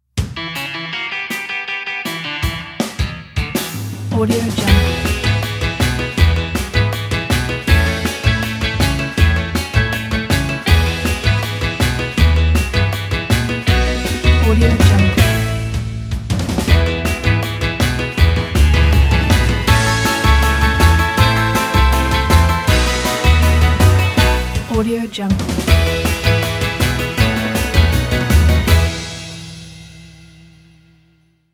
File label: sixties accompaniment